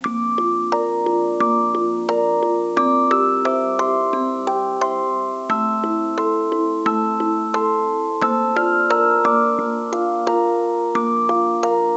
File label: Kids Loop; professor heinz wolff's gravity 2008